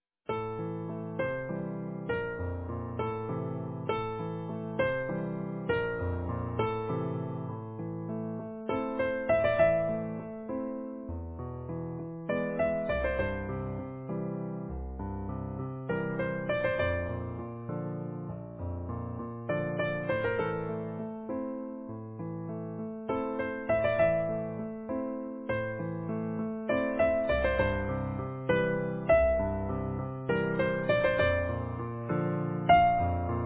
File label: Easy Piano